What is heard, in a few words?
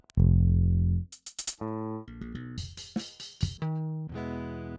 Filter Neutron, Virtual Analog